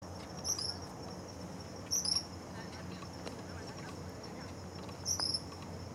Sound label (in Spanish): suiriri real